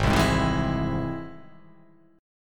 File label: G sharp Augmented; 4,3,6,5,4,3,6,x